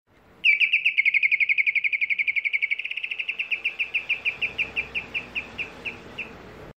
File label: Canary